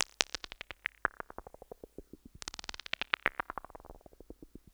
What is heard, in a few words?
Throwing Dices